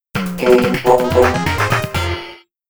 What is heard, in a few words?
SHOOT DOWN (GAME OVER); Tecmo Arcade Game Chronicle